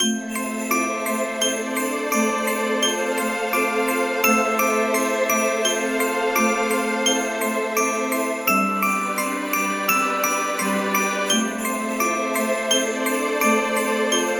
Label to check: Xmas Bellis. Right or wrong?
Right